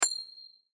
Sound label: Coin Flip Toss Ring; Money; Foley